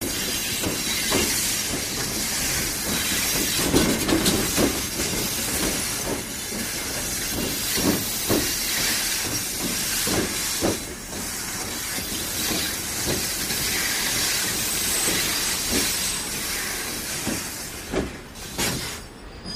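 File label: Diesel Locomotive Brakes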